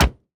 environment hammer hit

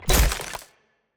Box Crystal Break; TANK; 3Souls

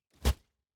building destruction stinger

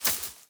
environment zone metal thread hit

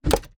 ui corridor construction plan place

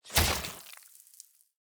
Drone Laser Flesh Impact; TANK; 3Souls

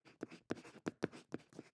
ambience room shadow room working layer

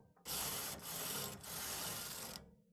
ambience room butchery working layer

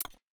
ui click cancel

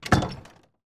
object chest open

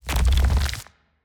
Earth Sphere Pick Up; TANK; 3Souls

